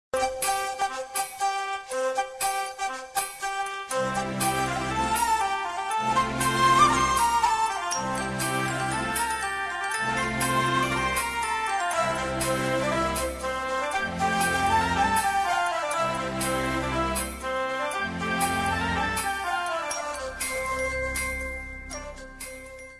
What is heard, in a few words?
flute interlude; country